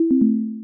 Wrong Mem Fragment S F X; Welcome to the Game II

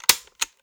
Button

